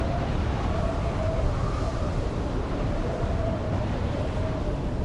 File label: Vent; Resurgence